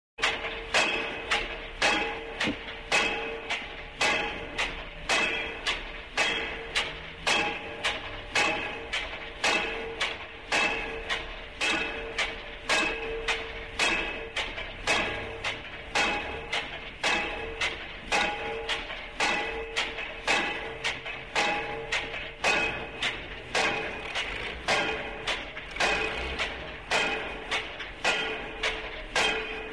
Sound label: Pile driver; SOUND EFFECTS1